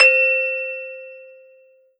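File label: Ensoniq ZR-76 Glockenspiel C5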